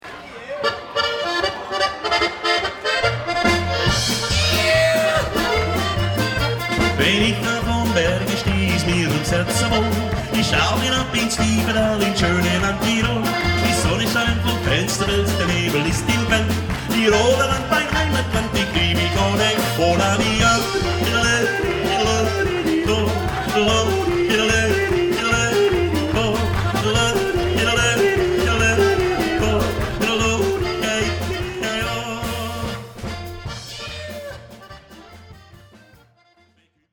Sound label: German Band; Orlando German Band